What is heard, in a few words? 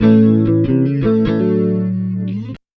BLUES, Acoustic Guitar Loops; USB Soundscan vol.04; AKAI